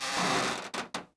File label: cart start; Cars; XBOX